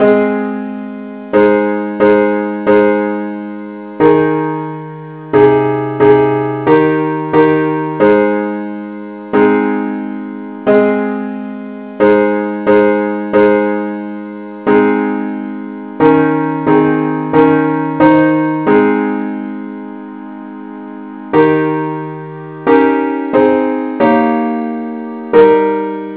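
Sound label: parts only, key A flat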